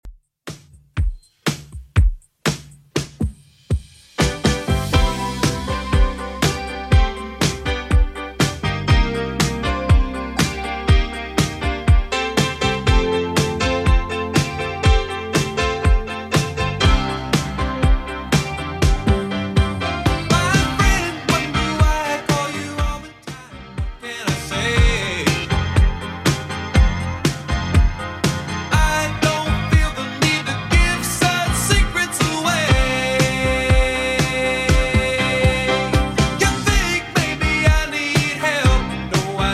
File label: 80s Redrum 121 bpm